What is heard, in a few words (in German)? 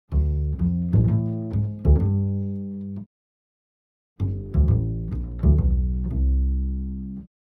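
Vorschläge von Leersaiten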